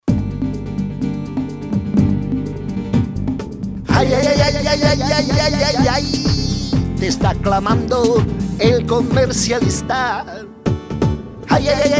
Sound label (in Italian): Suoneria personalizzata per Commercialista, Una suoneria personalizzata che canta il nome Comme